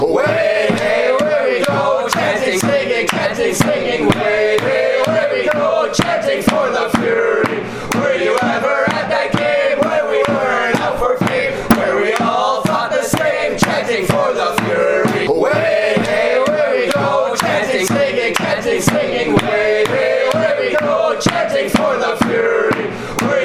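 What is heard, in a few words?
chanting fury, football songs and soccer chants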